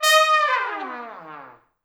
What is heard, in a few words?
Pop Brass; Best Service; ProSamples vol.19; AKAI